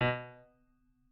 piano

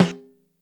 Snare

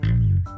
Bass A#1; 音MAD; 孤独摇滚

